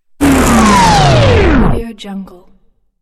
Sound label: power down